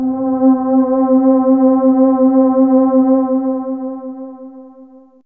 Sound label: String C3, HiString